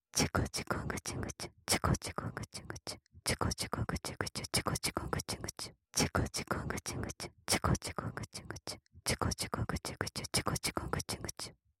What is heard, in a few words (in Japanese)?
オノマトペ素材 ちゅこぐちゅ（普通）